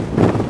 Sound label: flap, ships engine; Quantum Redshift